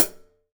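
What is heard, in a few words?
Hi-hat Acoustic closed; Acoustic and Electric; Polyend; Simulacrum Sounds; 1 Shots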